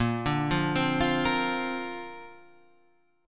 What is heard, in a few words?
A sharp Major Add 9th